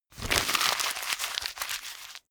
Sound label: sheet crumple fast